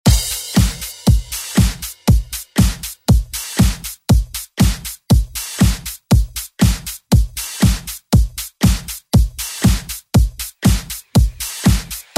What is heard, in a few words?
ReDrum; DJ Mhark